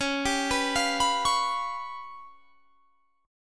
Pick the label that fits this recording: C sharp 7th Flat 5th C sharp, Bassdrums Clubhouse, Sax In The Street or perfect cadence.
C sharp 7th Flat 5th C sharp